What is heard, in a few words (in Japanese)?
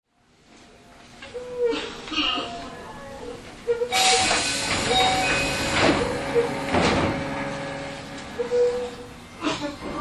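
VVVF車 南平→平山城址公園, 走行音; Keio; 改造後のインバーター車両です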